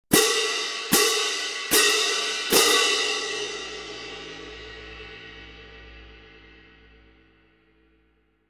K Constantinople Vintage Orchestral Medium Heavy Pair, cymbal